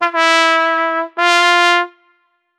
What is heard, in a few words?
festive trumpets blew; brass